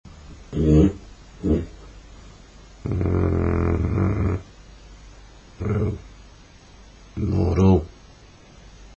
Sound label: snortle